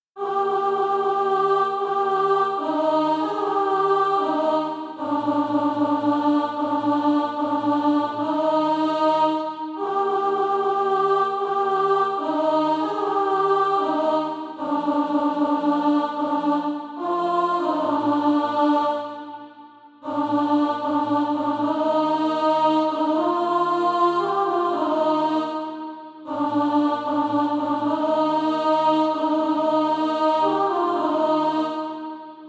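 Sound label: alto, SATB Practise Music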